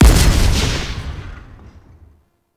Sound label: Sticky Bomb Proj Explosion; Grineer; Veilbreaker